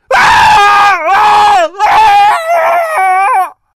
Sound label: scream; teamcity